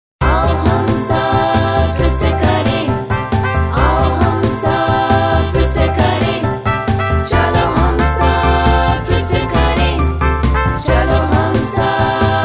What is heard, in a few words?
Twist Away Dance, Hindi Kids